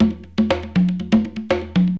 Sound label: CONGA 120, PERCUS LOOPS